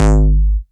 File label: Bass Cuts; Vengeance Samples; VES1 Instruments